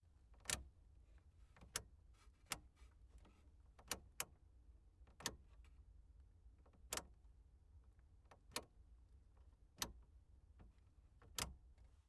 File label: Twisting Dial Clicks